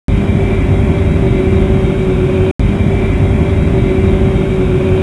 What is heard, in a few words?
jet, plane